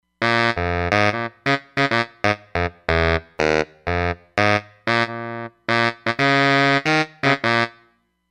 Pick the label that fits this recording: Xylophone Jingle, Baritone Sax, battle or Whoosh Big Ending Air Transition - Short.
Baritone Sax